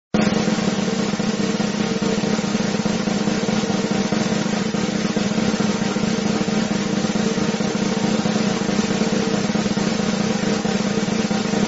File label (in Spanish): redoble de tambores; efectos de sonido sin copyright